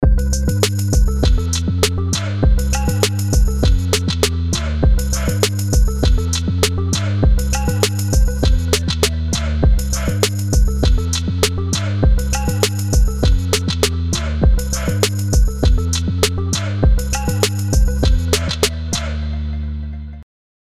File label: RnB sample; AKAI Professional MPK Mini MK3